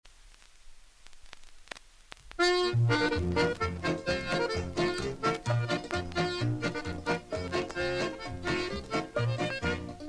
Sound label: Dutch Hop Polka